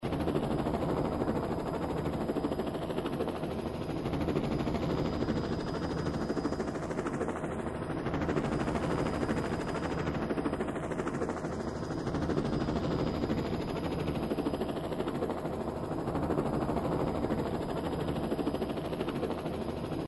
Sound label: Helicopter Up Down headphones